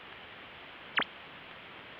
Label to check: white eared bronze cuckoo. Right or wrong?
Wrong